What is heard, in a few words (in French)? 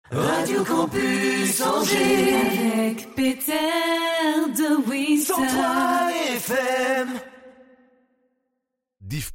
Drop Chante